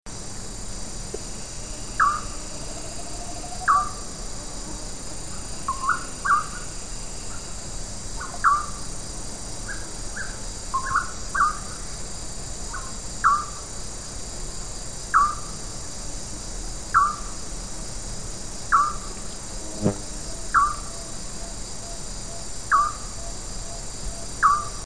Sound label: Band-tailed Nighthawk, S. America